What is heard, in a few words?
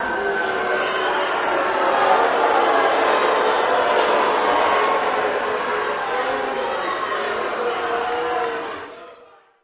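Crowd Boos During Fight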